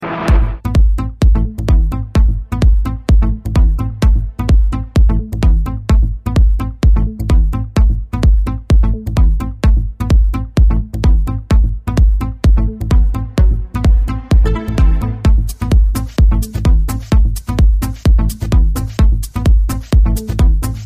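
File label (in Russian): house muzika, house music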